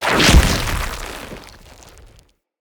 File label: Root Spider Mother Web Shot Proj Burst; Animals; Spiders; Abilities